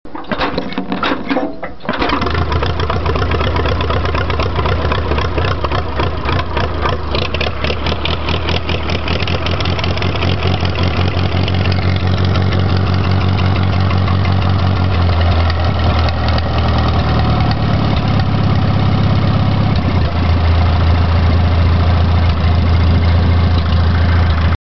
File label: farmall